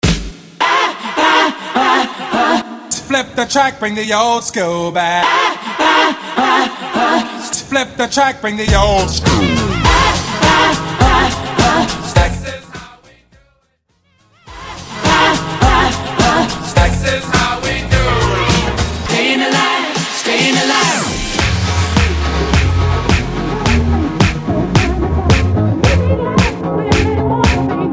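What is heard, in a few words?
70s Mainstream Acapella In Hype Transition House; Mainstream VIP; Vegas Edit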